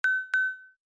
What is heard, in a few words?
Stop Haptic; iOS Sounds